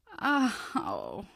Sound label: moan, female; Source Engine; video game; npc